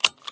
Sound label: egun toggle; Weapons Guns Misc